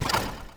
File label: MOVE; C64 Dreams; Multi-Sound Default